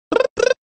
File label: Brrp Brrp